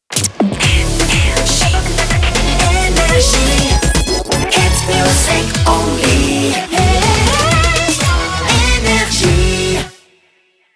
Jingles; PURE Jingles; NRJ

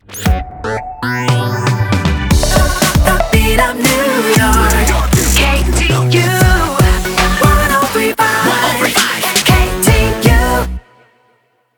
Jingles; PURE Jingles; 103.5 KTU

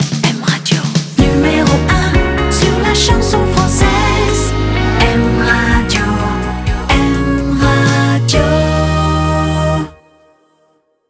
Jingles; PURE Jingles; M Radio

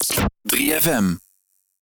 Sweep

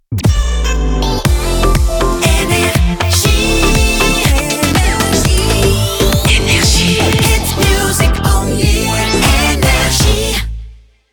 Jingles; PURE Jingles; NRJ

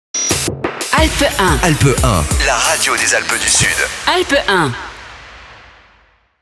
Sweeper; klemprod; ALPES 1

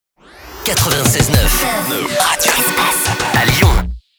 Jingles - Shotgun ID; PURE Jingles; Radio Espace

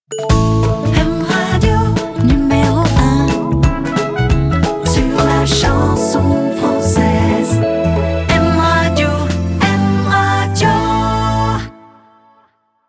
Jingles; PURE Jingles; M Radio

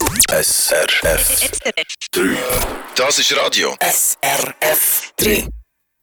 Sweeper; SRF3

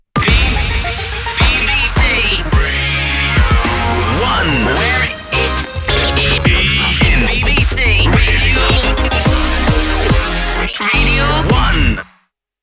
Jingles; PURE Jingles; BBC Radio 1

